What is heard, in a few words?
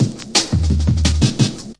172 horny drums; 161 to 180 bpm